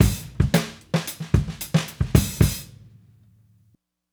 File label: Raw Funk Drums Ending; Sonic Foundry (Sony Creative Software); What it Is! 70s Analog Funk